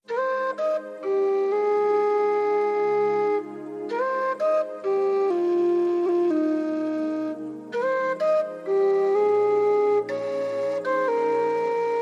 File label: Solo Tin Whistle; 60sec